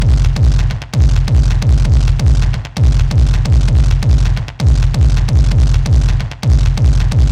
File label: industrial kick